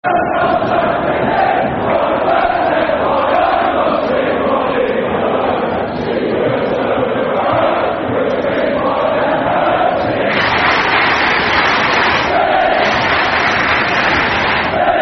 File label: Football Chants (Soccer Songs); Reading; Molly Malone; Reading Rfc